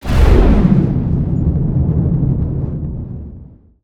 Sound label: pad Launch; Mindustry